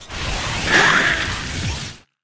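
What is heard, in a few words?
Leviathan damage; Seer; GamigoNA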